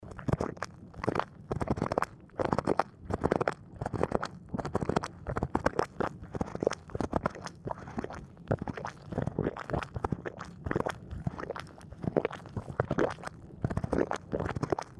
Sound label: Black Sand Basin Small Vent Near Cliffside Geyser Overlook; MKH